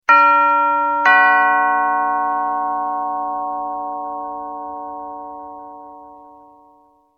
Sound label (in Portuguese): campainha de casa dingdong